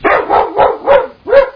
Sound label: bark; dod puppy